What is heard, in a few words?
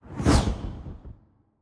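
Shaman Fire swoosh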